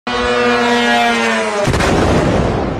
drone

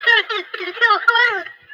calm down noises

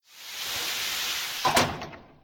door open

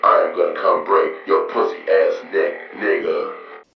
droid

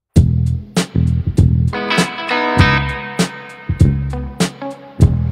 bass

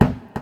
spatial thud denoise

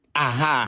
ahhha

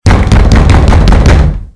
door banging

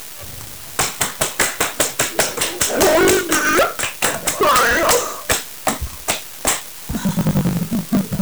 clapping harder

